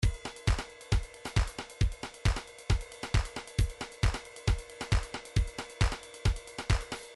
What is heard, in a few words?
trance midi loops beats